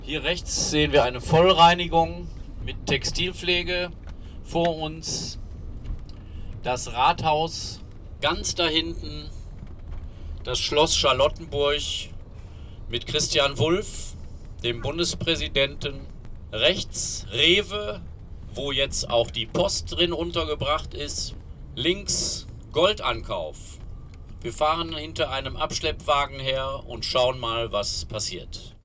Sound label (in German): Fahrt Ton